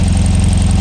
motor

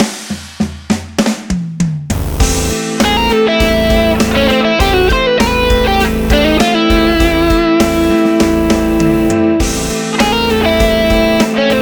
Lick

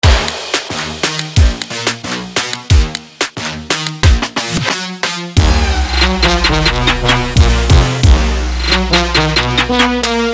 TRUMPET

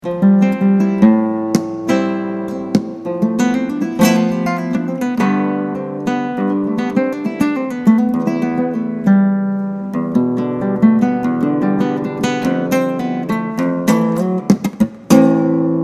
Flamenco; Foro Flamenco JW Challenge

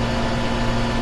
motor

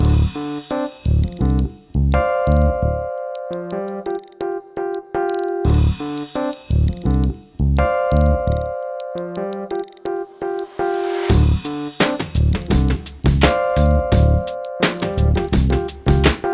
Groove 85

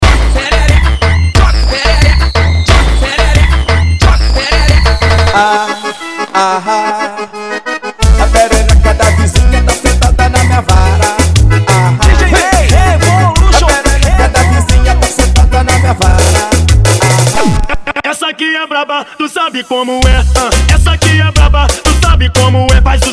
TECNO FUNK

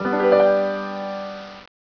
piano

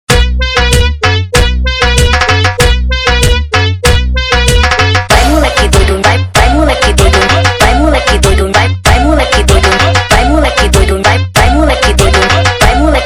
TecnoFunk

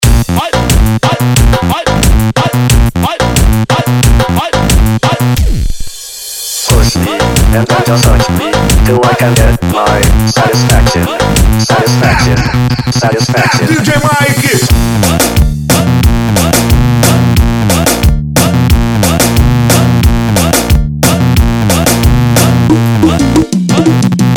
ELECTRO BASS

